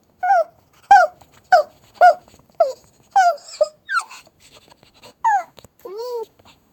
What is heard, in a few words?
soft panting and playful; affection